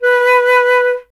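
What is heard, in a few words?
flute B; Miroslav Vitous; Solo Instruments VOL-2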